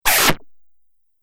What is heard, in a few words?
Thick Suction Swoosh; Whoosh Sound Effects; Swish, Zoom, Swipe, Whoosh, Swoop; Andrew Fleming